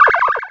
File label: Cry